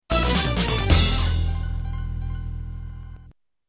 music game over

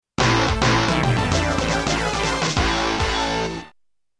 Music VS; Super Street Fighter II

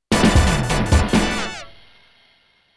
Music New Challenger

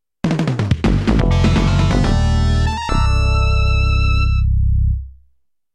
Round Clear; Shinobi III

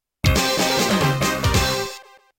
Music Game Over; Super Street Fighter II

